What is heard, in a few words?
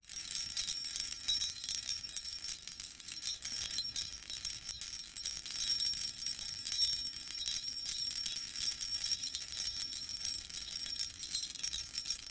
loud gold chains sound; jewelry